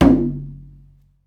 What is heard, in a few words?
MalaiyuDrm; Club-50 - Foundations Roland; Exotic Drms